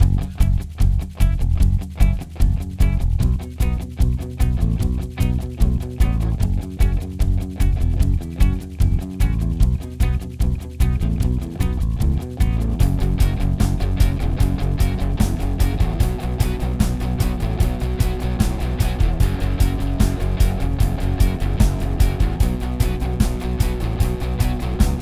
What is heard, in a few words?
Question Music; Jackbox Games; The Jackbox Party Pack 5; YDKJ